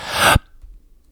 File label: Inhale